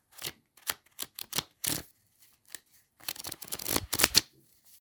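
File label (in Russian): быстро пролистывает доллары; Звук купюр, долларов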